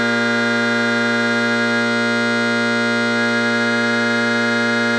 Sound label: g tuning, pipes